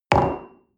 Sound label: coffee place table medium, restaurant cafe